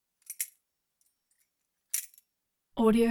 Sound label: butterfly knife